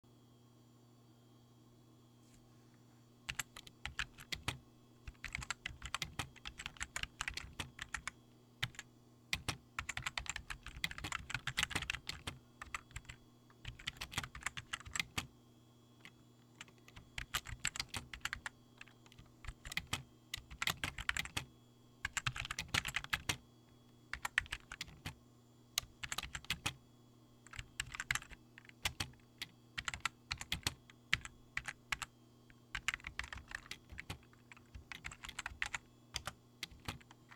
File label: typing medium